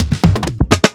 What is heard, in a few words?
Drum Fill 127 BPM; Drum Accents; BigHit; Cymatics; GHOST (Beta Pack)